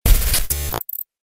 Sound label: Sparkly futuristic glitch